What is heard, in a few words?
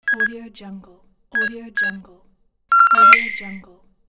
digital signal processor